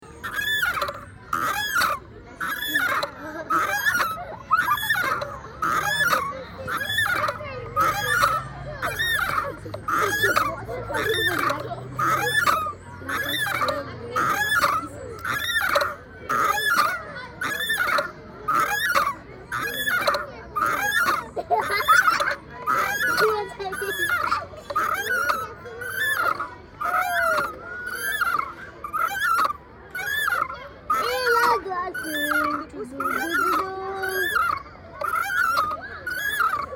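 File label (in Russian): kacheli, swing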